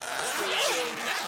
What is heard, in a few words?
Duffle Bag Zipper; Sound Effects Bible